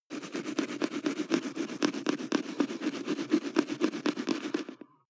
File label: teeth brush dry closed molars